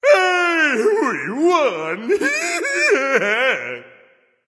nv yay; Smite; ymir skin12

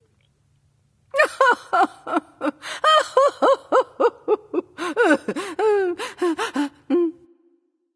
boohoo; smite; voicepack; hera

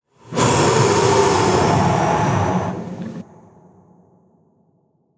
breath; smite; voicepack; hachiman

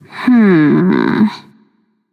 thinking, voicepack; smite; pele

